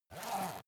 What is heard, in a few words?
zipper use short